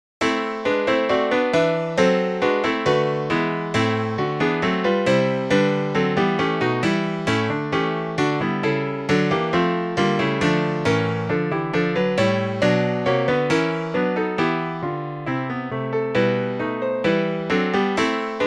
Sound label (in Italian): pianoforte